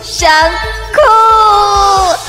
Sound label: cry